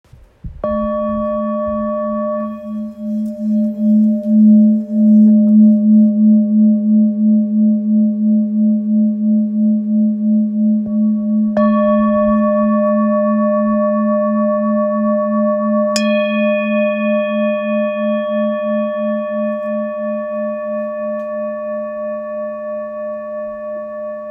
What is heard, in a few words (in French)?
Bol ancien re